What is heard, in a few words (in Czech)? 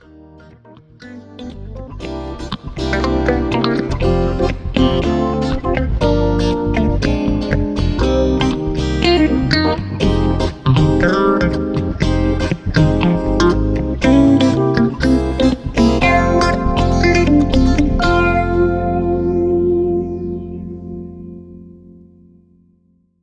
Filmový jazz